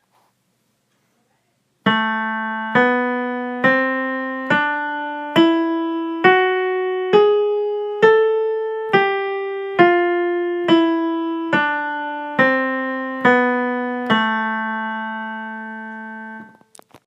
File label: melodic minor scale